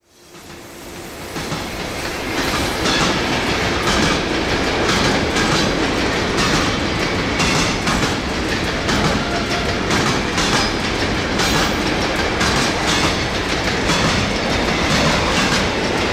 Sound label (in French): bruit joints de rails; sonotheque